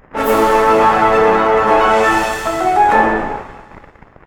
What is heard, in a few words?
free spin Outro; eggomatic